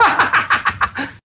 laugh silly; ooh ahh

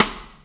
Real Closed Hats

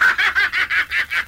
laugh

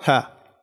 ha, Voice ooh ahh

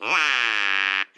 wah duck call; ooh ahh

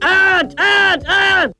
ah ah ah MALE; ooh ahh

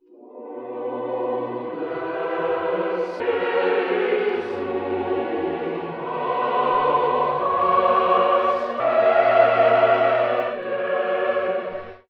voice choir of nonsense; Loops; Sad

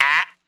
"ah" shock, Voice; ooh ahh

